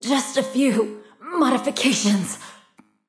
death; smite; kali

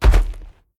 foot land; smite; zhongkui

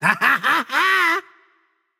nv yay; smite; xbalanque

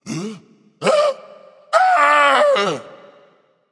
fear; smite; voicepack; kumbhakarna

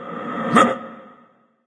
grunt jump; smite; xingtian

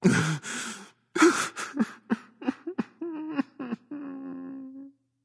boo hoo; smite

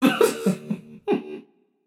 confused, voicepack; smite; jorm

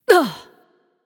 grunt hit; smite; nuwa

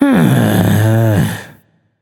sigh, voicepack; smite; jorm

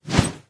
pipe swing; smite; xbalanque

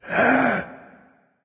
nv breath, vox; smite; Vulcan

